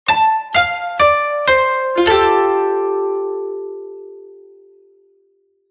Koto

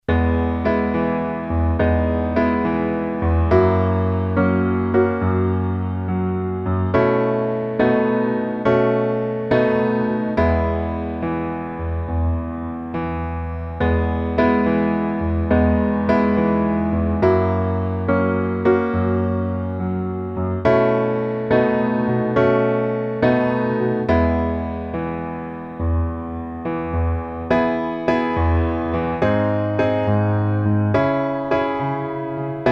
piano

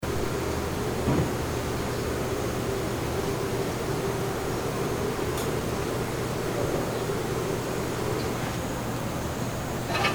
fishing villages

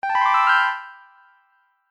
pirorin

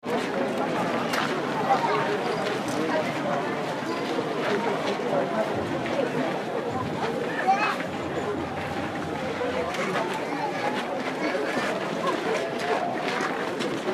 dazaifutenmangu

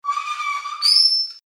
bicycle brake

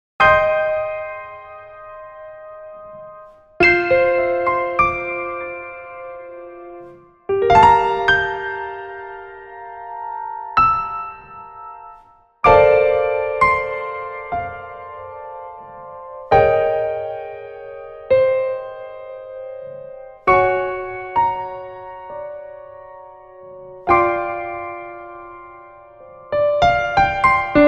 piano

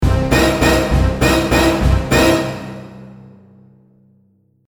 JINGLE pity

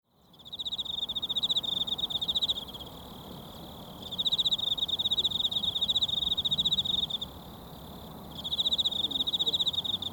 rice field

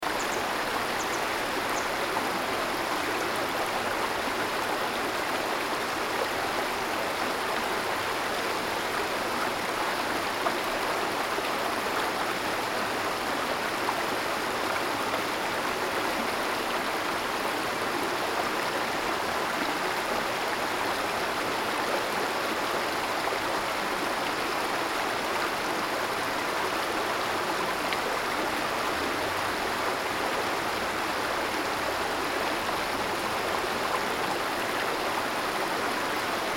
river

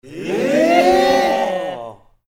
surprise

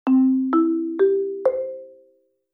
pinponpanpon

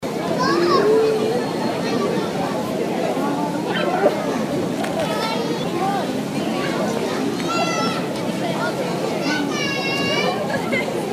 park family kids

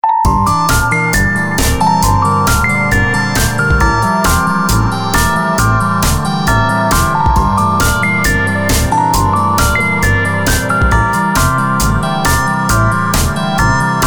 synth

